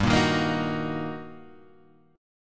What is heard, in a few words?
G Minor Major 7th Sharp 5th, G, down, Guitar, Half Step Down